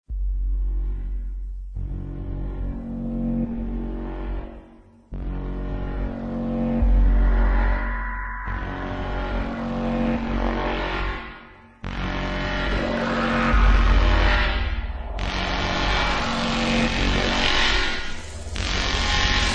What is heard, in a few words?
dubstep, ringtones; optimus prime